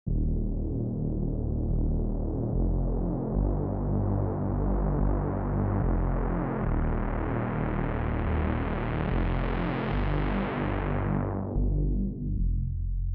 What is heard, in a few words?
Bass; Reece; FOCUS Preset Pack